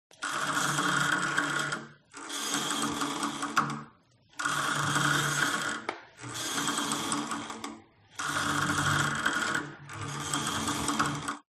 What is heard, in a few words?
SPORT GYM Cable Machine Old Pull Release Sequence Fast; smartsound